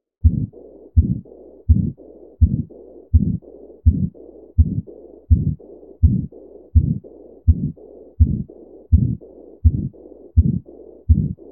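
Aortic, Systolic & Diastolic Murmur, Sitting, Bell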